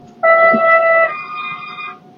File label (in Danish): syg tyfon